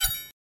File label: chime; sorcery